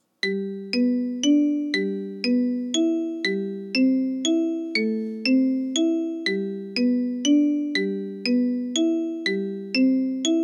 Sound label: alto exercise, kalimba